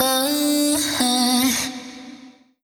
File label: Vocal Sample Pack Phrases G; Slate Digital Omnivox Vocal Sample Pack